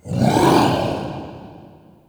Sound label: Interior Light Burps; Ambience; Indoor; Warframe; Infested Eris